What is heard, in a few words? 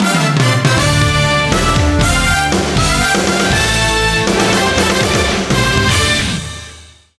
fanfares Touchdown